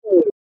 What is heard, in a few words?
Bar chart fade out animation infographics